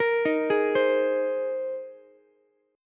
Bb 7th Suspended 2nd Suspended 4th Bb Ukulele, chord; 15,15,16,15; arpeggiated